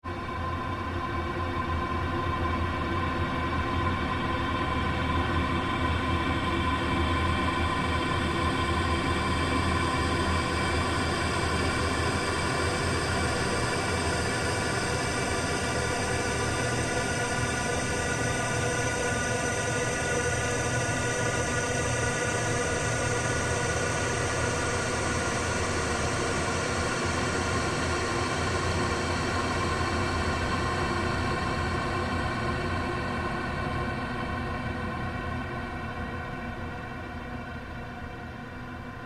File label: Created Drone, Drone Sound Effects